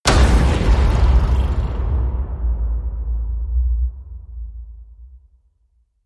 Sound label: hit big cyber attack; brand x music